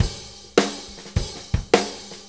Blues Shuffle, Drum Loops

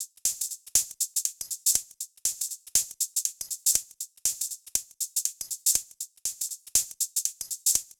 Hh Sh Loop 120; Equipped Music; Loungin House

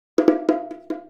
Bongo Fill; Drums; Bongos; Essential Percussion

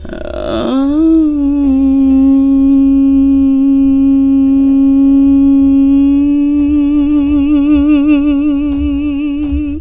FEMALE VOX, Hip Hop

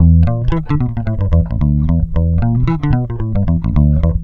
bass, Roots Funk; USB Soundscan vol.41; AKAI

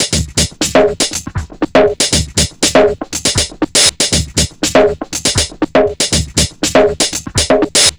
Percussive; Sonic Foundry (Sony Creative Software); Machine Language

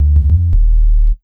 SUB, Ragga & Dub Reggae; USB Soundscan vol.27; AKAI

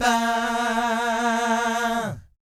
VIB FX, Vocal Planet Jazz & FX; ILIO; JAZZCOMBO

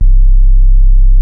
SubSonic Basses, sine C1; E-MU Formula 4000 Series Vol. 1; Hip Hop Nation

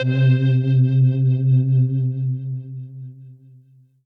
Synth C, Synth Prophet One Shots; Electronisounds

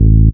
DR. LOOP, BASS; X-Static Goldmine 3

